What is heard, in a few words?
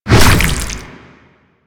Infested Ancient Tentacle Swing Hit; Warframe